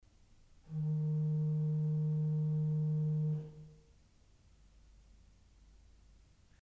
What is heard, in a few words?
Principal 4' DIS, orgel pipes